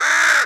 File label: squawk pain, crow; critters